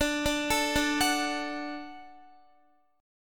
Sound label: D-Major, D, Guitar, 4F, 4A, 5C, 5G, 5C, 6E; D arpeggiated; JGuitar